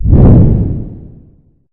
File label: swing; operation